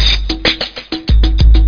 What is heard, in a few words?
jig